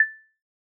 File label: spinner bonus; MarkMoon